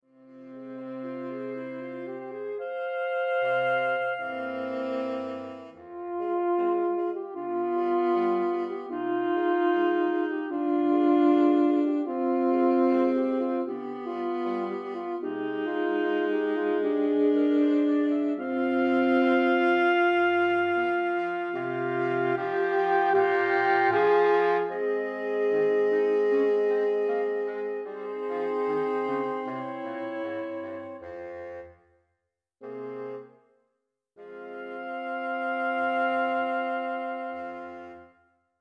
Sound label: Pavane Op 50 sax quintet; Faure; Large Ensemble